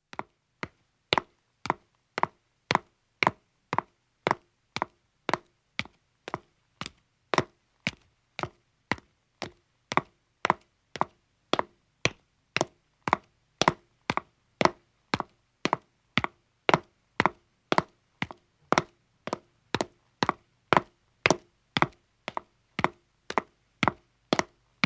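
Footstep stone descending, 1 woman starts and stops; Footsteps (Sound Effects:Human); BBC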